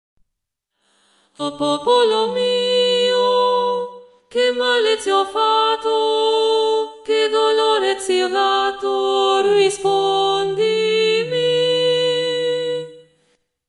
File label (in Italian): O popolo mio donne